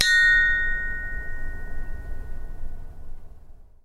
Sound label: chinese bronze bell side; sackler